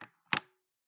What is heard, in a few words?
household living room lounge remote control small wood table